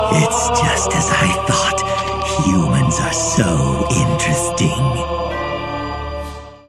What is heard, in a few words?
win; freak fortress 2; ryuk